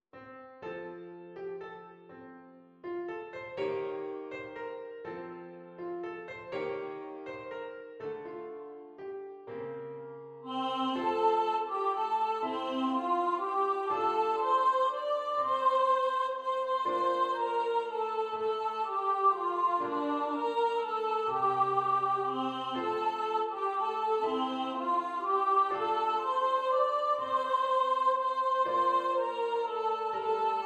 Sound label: If I Was A Blackbird SOPRANO